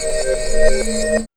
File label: REVERS